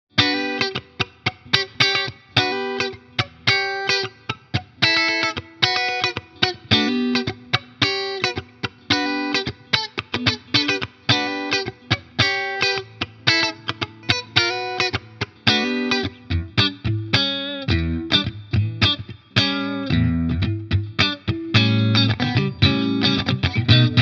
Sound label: Honey Comp funky Comp max.; Carl Martin